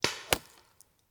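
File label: Paintball Gun Impact; Sound Ideas; Guns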